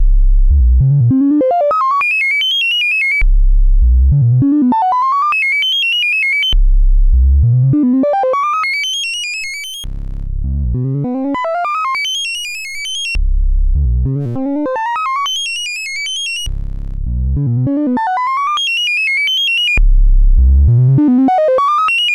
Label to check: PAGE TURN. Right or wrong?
Wrong